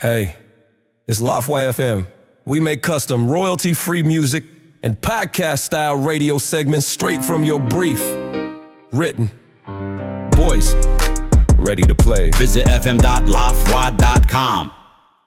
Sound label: HipHop, Short musical radio segment with a hip hop bed; 15s cut; HipHop musical sting; Music + voiceover demo